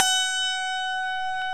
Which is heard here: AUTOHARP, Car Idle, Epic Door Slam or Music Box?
AUTOHARP